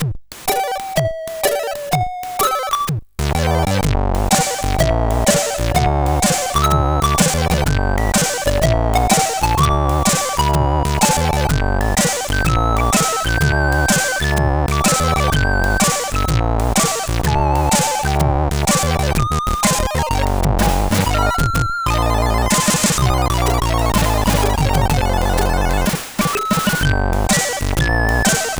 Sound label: sid CSG8580R5